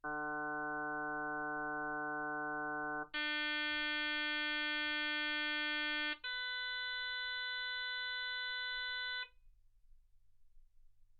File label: harm sinus 48kHz